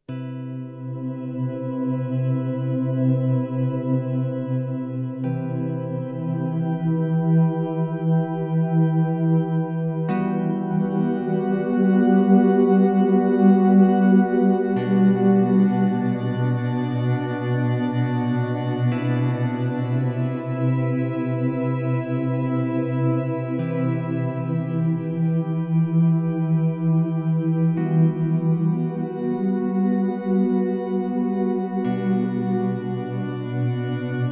Freeze Slow Fade obere Oktave; Chase Bliss Onward

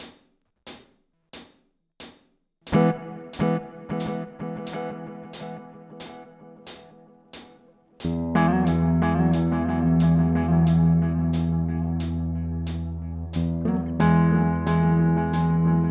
Coole Rhythmen mit Delay 90 BPM Multitap Raum; Guitar Rig 7

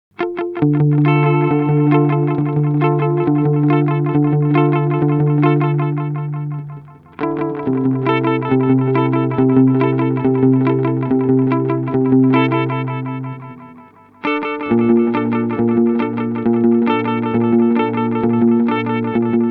Earthquaker Devices Disaster Transport time 9 Uhr mod speed mid mix max mod mode stretch

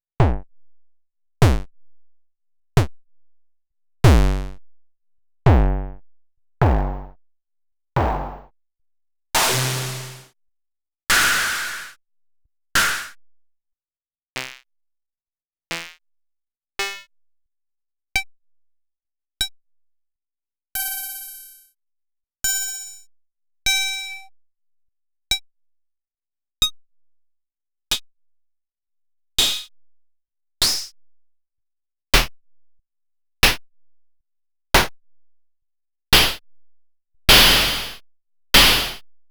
Samplerfutter Einzelsounds; Body Synths Metal Fetishist

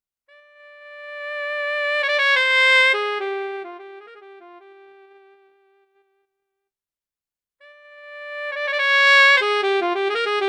YDS-120 Altsax

